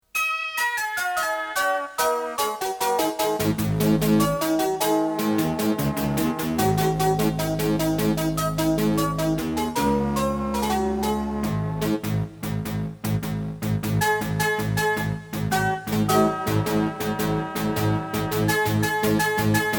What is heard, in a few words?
happy mallet; crossover; xylophone + flute theme; bass clarinet a.o. bass